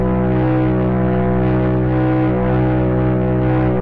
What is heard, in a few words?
plane; hedgewars